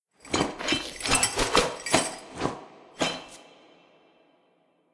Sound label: anim walk cartwheel